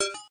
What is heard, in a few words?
Stopper Hit; Pushover